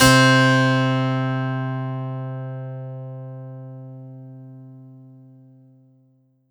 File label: Korg 01W Double Stop C3